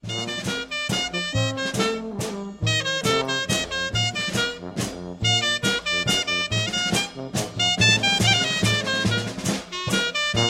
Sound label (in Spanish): Mazurca